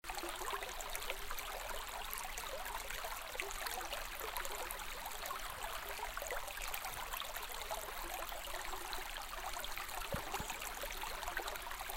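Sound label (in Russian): river mouth, water flows in the river, birds sing in the background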